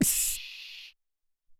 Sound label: Vox Hiss